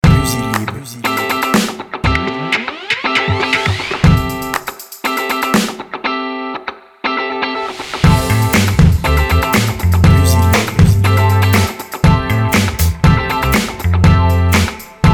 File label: high groove